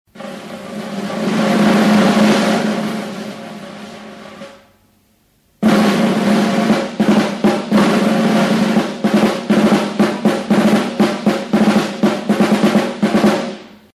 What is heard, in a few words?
snare drum; PERCUSSION INSTRUMENTS